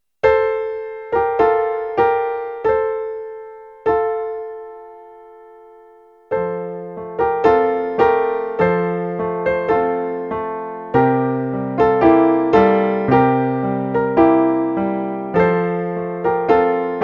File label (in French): Kyrie Eleison Orthodoxe Russe Instrumental